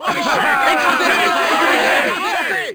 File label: burst, Crowd; French